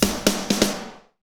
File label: Medium Tekno, Drumscapes Roland; Northstar - Drumscapes Roland